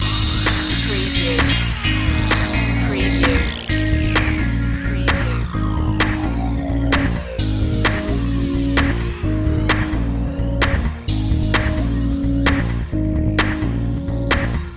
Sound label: loop, Dance Workout / Aerobics